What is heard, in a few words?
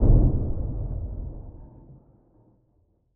train impact distant